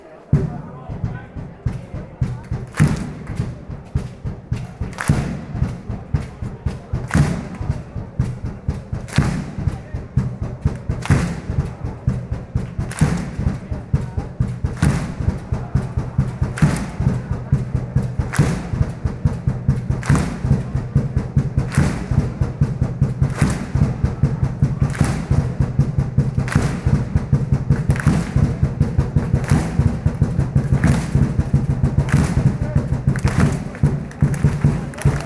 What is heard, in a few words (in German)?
Schlagzeug